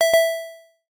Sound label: tick